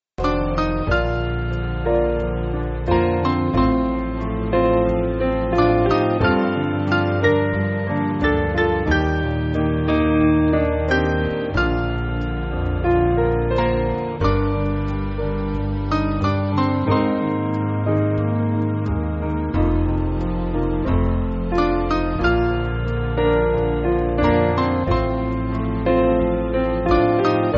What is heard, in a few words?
piano